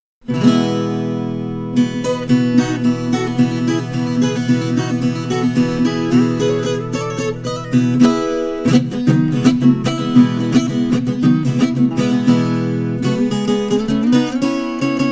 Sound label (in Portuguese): viola pagode